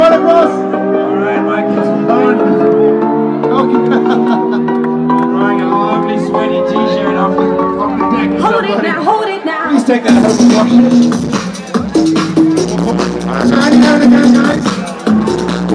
skateboarding dubstep

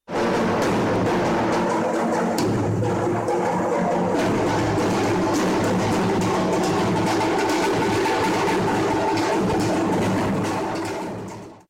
dhol drumming; Neil Ghosh

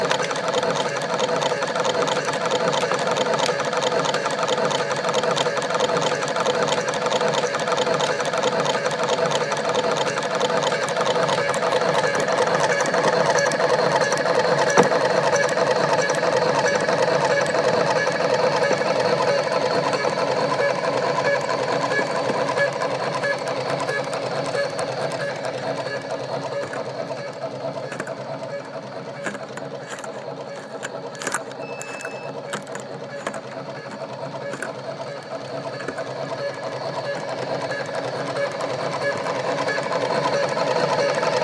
wire stripping machine

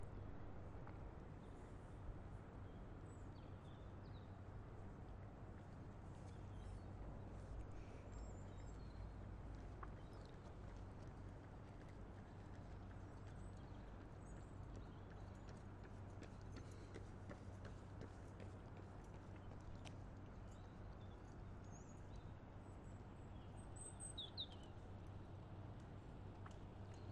beautiful birdsong by the thames

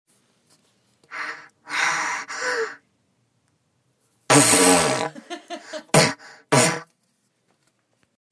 trumpet involuntary

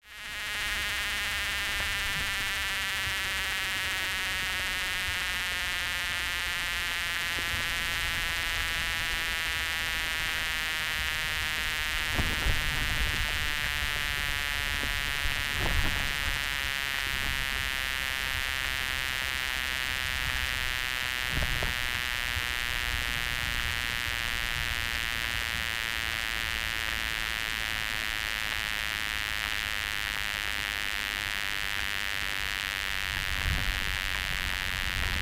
hogganfield pond hydrophone recording

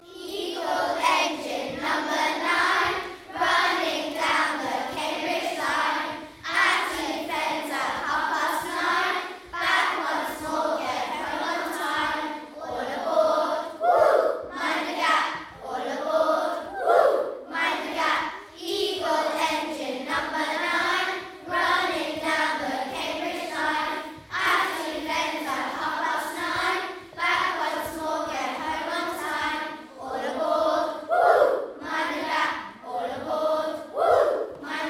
eagle engine

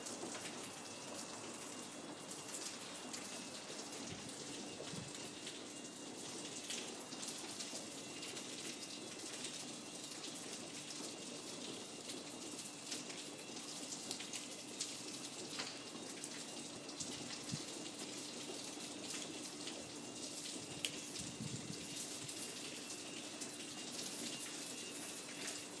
midnight fire alarm

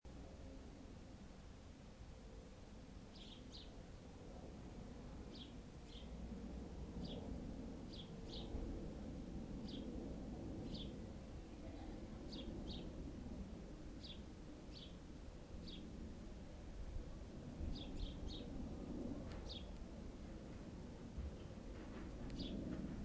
garden bird tweets

